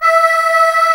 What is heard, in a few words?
TREMOLO STR MIX, Strings